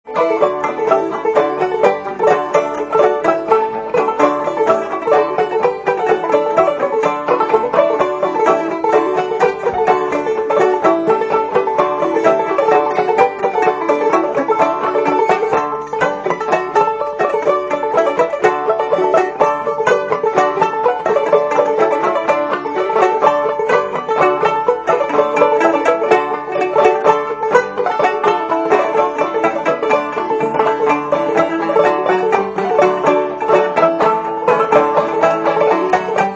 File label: Banjo